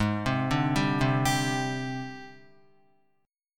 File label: Ab Major 7th Flat 5th Ab, down, Guitar; arpeggiated; JGuitar